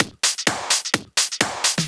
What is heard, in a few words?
Clubhouse Loop 128 BPM; Best Service; House Definition VOL-1